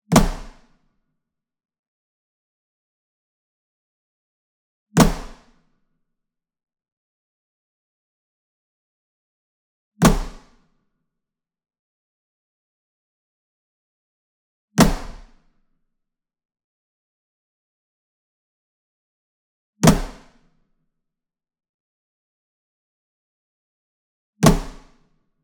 SINGLE DRUM Shime Daiko Flam Near, Cinematic Strikes; boom library; Cinematic Strikes Construction Kit